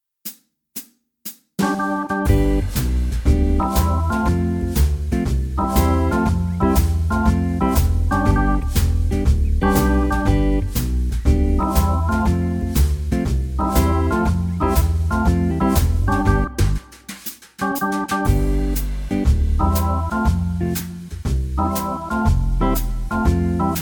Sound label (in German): vollinstrumentiertes Playback CoolJazzClub G-Dur